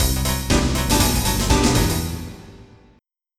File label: FILL, HOUSE; KORG I-S; MIDIDATA; MIDI Music File; MIDICraft's MIDINET CD-ROM